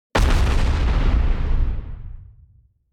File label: explosion; anemoi